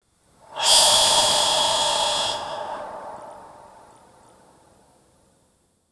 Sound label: hatch open and air; gasmask